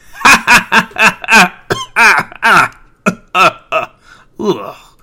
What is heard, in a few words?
laugh; freak fortress 2; graymann